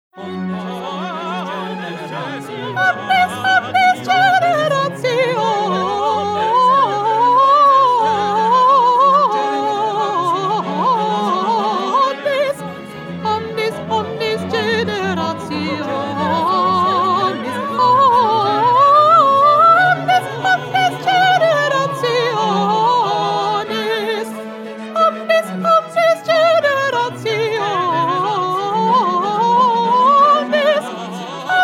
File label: First Soprano